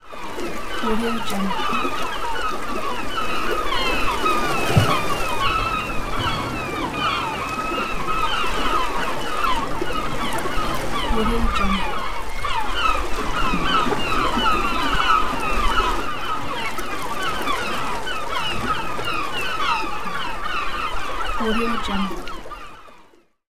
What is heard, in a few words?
seagulls